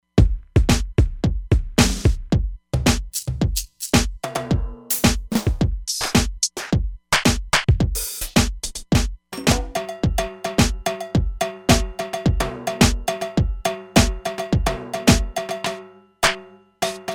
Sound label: Hip Hop Latin